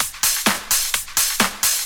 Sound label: Discohouse Loop 128 BPM